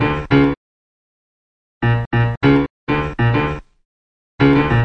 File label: 099 moody hip hop piano; KEYS ORGAN PIANO LOOPS